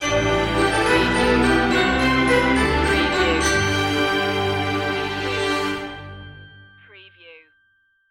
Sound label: regal orchestral logo